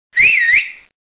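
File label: Whistle; Atomic Clock 6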